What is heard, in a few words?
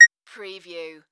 Multimedia Beep